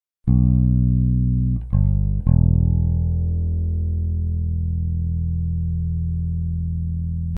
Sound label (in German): Beide Pickups Tone Regler offen; Harley Benton JB